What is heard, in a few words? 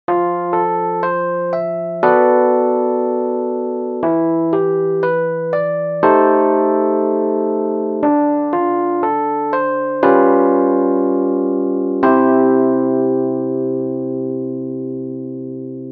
Organ Chords